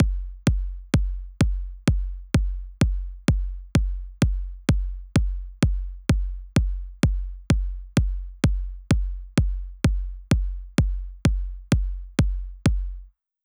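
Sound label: bass drum, Drum Loop 128BPM; VEC5 Drumloops; VEC5 Multi Loops; Loop Elements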